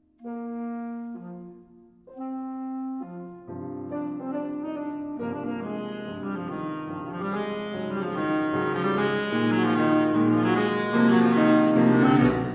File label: Quick Step